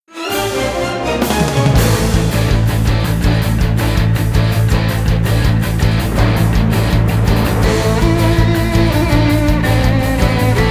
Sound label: Bgm battle